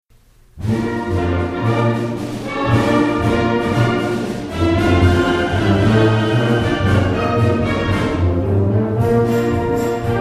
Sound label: Dixieland Strut